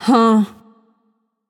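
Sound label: confused, female; smite; voicepack; hera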